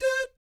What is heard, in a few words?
DOOT C5, Vocal Jazz Fx; Spectrasonics; Vocal Planet Vol.3 Jazz Fx